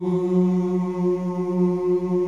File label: G1 MALE CHOIR, OOS; Northstar - New Gold VOL-2